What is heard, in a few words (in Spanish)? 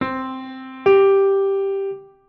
sexta disminuida ascendente; sextas